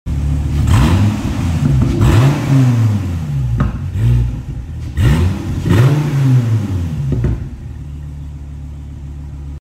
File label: Dodge Viper